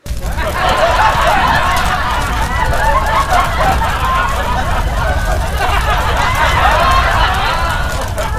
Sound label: fire laugh